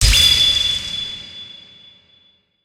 symbol Freeze End; shangrila

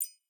coin Value Click; wilderland

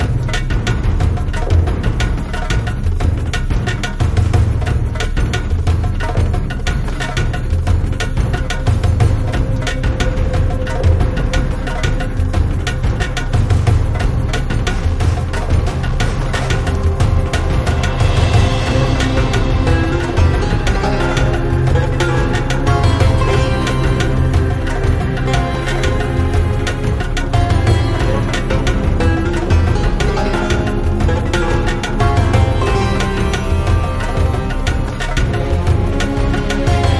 freespin Intense Ambience; mercy of the gods

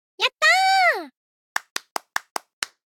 Cheer; magic maid cafe

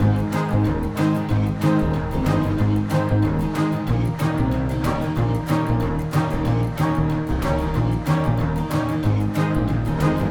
intro LOOP; cashomatic

